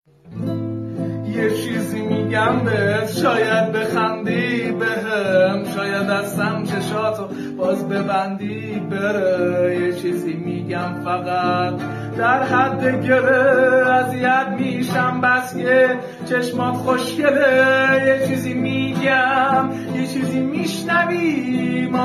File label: Acoustic